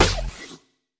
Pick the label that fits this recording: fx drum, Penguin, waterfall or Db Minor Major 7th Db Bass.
fx drum